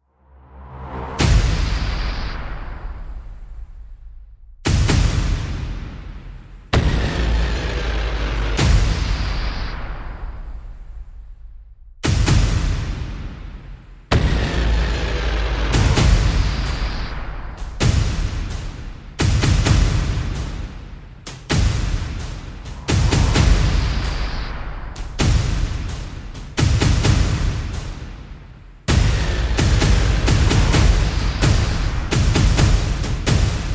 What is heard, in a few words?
Percussion and FX